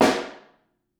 Snare Room

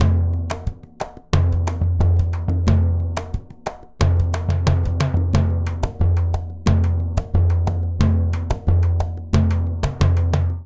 Murga Surdo 90bpm, Latin Percussion; Ableton; Factory Packs

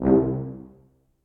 C Tuba Solo Staccato; Ableton; Orchestral Brass

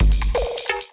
Kit groove 130 bpm, Glitch and Wash; MIDI Clips; 122 - 130 bpm; Salient Kit; Ableton

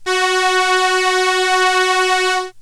Solina Viola F#3; Analog Synths; Ableton Foundation FreePacks

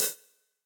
Hihat Closed Bright Room; Drum Hits; Standard Hits; Ableton; Factory Packs; Session Drums Studio

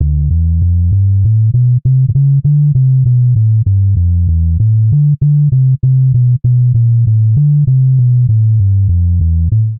D Minor 98bpm, Bassline; MIDI Clips; Beat That; Ableton; Factory Packs; Chop and Swing

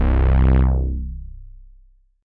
Release C1, Analog Synths; Ableton Foundation FreePacks

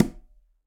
Conga B Heel; Latin Percussion; Drums; Drum Hits; Ableton; Factory Packs

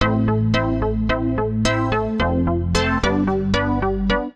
Poly Keys (Am) 110 bpm; Music Loops; Poly FabKeys; Ableton; Factory Packs; Singularities

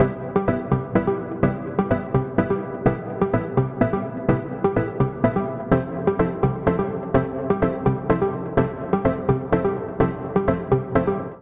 Electric Pop A Minor 84 bpm; Accompaniment; Tonal; MIDI Clips; Drive and Glow; Ableton; Factory Packs

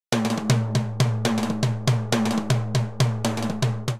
Timbales B; Latin Percussion; Instrument Kits; Ableton; Factory Packs

